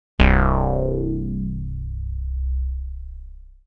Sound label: Moogli-Bass